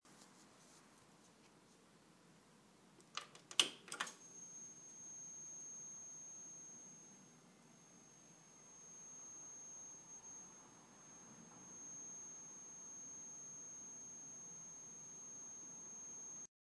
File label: crt switch on